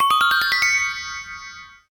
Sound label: Magic Wand, notifications; LG V50S